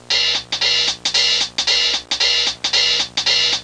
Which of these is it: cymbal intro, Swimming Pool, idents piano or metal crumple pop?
cymbal intro